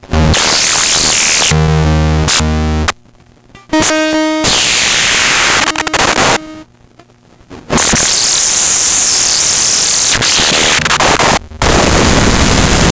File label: distort, guitar; msp430f169 guitar